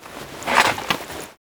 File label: Hunting Bow Load; Warframe; Foley